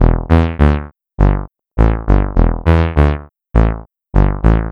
Moog Bass; Sonic Foundry (Sony Creative Software) - Rondo Brothers Trip-Hop Loops & Samples; Liberation

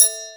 JX1 bell; E-MU Formula 4000 Series Vol. 2; OrbitPercMaster

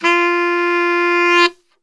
F 1 SAX, Brass Super Section; Best Service

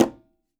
Quinto, Percussion

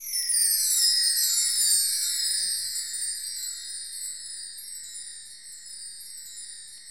chimes, Roland LA Composer; Roland - LA Composer vol. 1; STANDARD PRC

